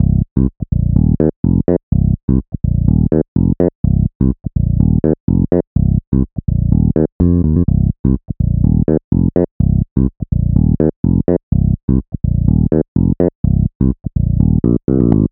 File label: Before 125 BPM Cm